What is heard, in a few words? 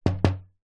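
sounds of a mirror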